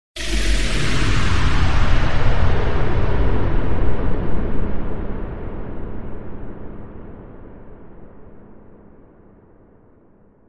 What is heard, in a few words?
Hyper Space